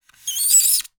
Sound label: Polystyrene Squeak